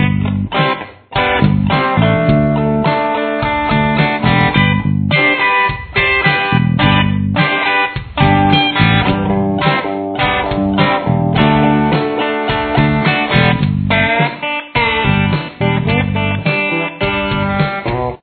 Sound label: chorus, guitar